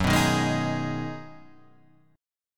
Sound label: Ab Minor 7th